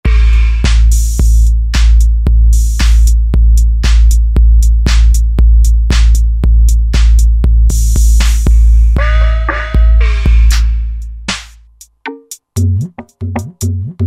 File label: Tekno Asia Set, Roland SR-JV80-14 World Collection Asia